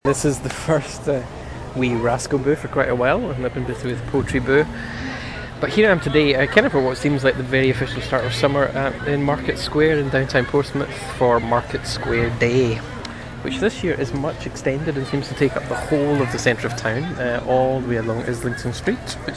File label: market square